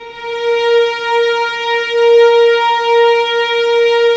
VNS A#4, Violins; 23 Violins; String Ensembles; Miroslav Vitous

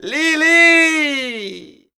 flamenco vocals, male

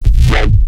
Growl Bass; neuro; BIG5AUDIO

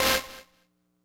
synth stab, Amin; Big Fish Audio; Hardcore Breakbeat Action; E-thereal

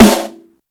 ROCK SNARE; Greytsounds VOL-1

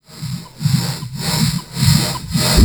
NOISE REV; USB Soundscan vol.27

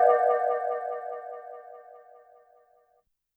Sonar H, YAMAHA RM1X

